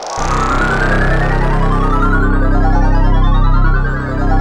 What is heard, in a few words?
SYN JD8000, Roland; Sound & Vision - Gigapack I CD 2; JD 800/990